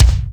sharp bass drum single shot b key, kick drum single hit tuned to the B note